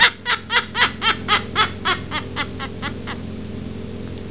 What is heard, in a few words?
laugh